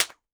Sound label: bullet by supersonic crack; weapons; nearmiss; Counter Strike Model Pack; CS2